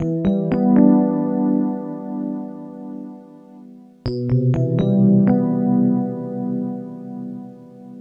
Keyboard

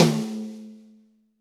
Snare, Roland TD-20

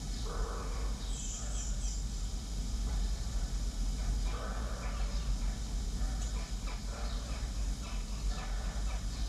Ambience Loop, outdoor

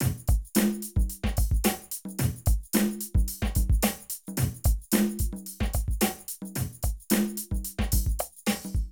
Drum loops, Streetsoul; Zero-G Groove Construction (1993)

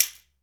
Latin Shakers, mexican

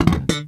SLAP IT, ELEC BASS; East West - Global Sounds

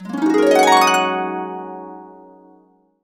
Complete Harps; USB Soundscan vol.62; AKAI

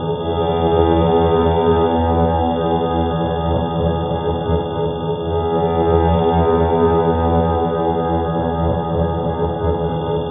Feedback Horn; Sonic Foundry (Sony Creative Software); Loop Noir; Dark Organic Processing

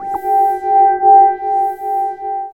SCI FI; East West; Phat & Phunky

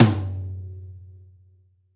Pop Tom, Roland BK-5

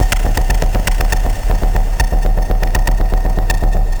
Structured Rhythm, IDM Rhythms

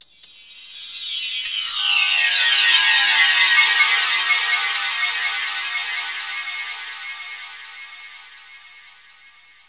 glass bend, glass bender; Spectrasonic Distorted Reality 1

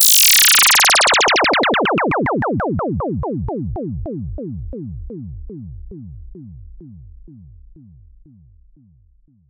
Synth FX

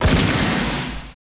GSHOT, LA GUNSHO; Total Control - Action Cutz

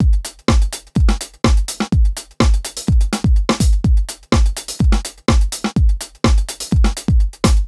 beat, 125 Beats; PowerFX

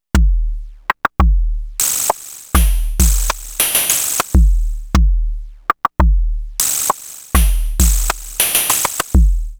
Money Groove, Beats

